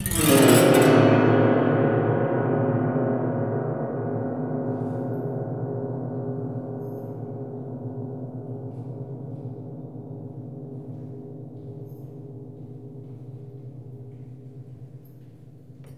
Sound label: swipe strings; Cinematic Metal Impacts